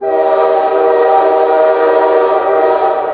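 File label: horn blow; outlaws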